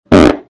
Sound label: fart